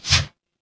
ui swipe

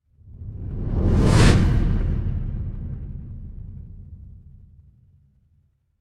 fire electric whoosh flame

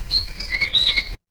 animal bird

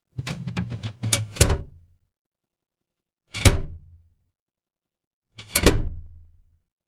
mechanical lever

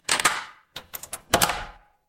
door lock

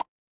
ui pop

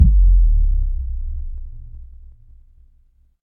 transition impact hit

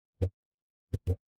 ui menu

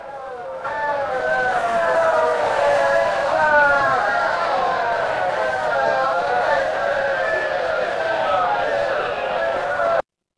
crowd cheer

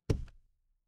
impact thud

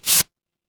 paper fabric tear

